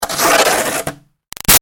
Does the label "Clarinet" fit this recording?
No